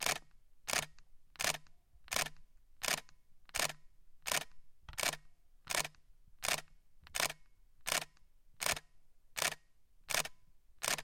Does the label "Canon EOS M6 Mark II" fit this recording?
Yes